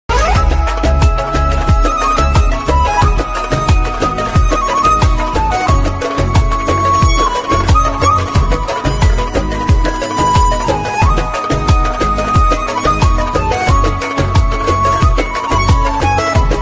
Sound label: Garmon